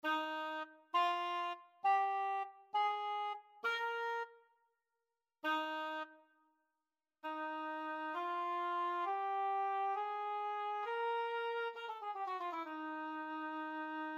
Articulation Example

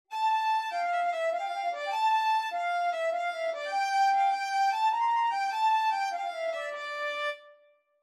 texture heterophonic; texture exercise thick and thin

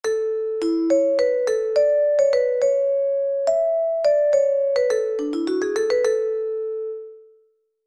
6 8 time vibraphone; Meter

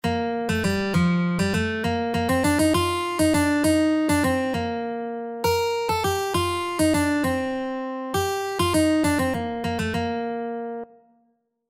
Intervals scalic acoustic guitar

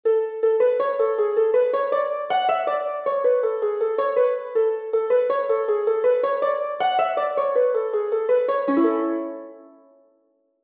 Intervals wavelike harp

